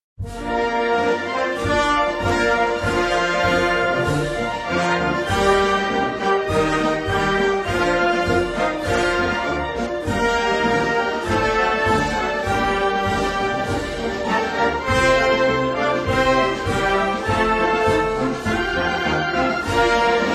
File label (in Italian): Inno Nazionale Irlandese; P HEENEY